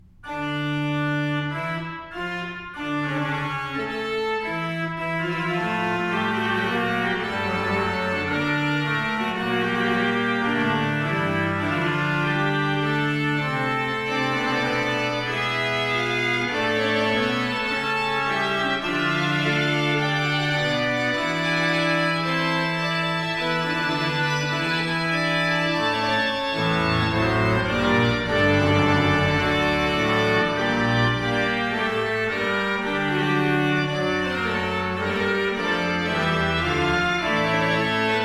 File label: Fugue grave; Boyvin; Formentelli